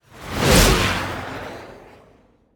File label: Speeding swoosh; xe dua